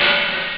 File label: open, drums; OctaMED SoundStudio V1